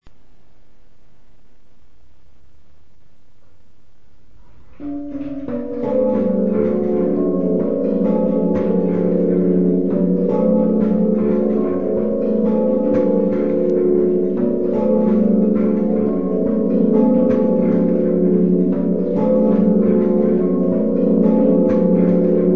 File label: muffled, ringing